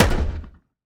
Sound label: Shotgun